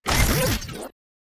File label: body flinch arm head; Titanfall 2